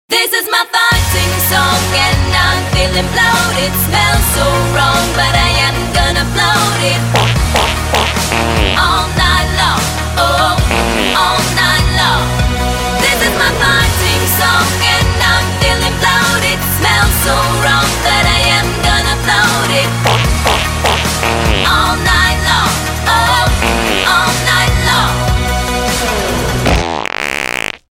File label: FARTING SONG HOOK; USRN; comedy demo